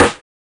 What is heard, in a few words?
LINN RIM LO; SIDESTIKS